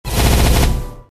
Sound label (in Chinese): 瞬移-连闪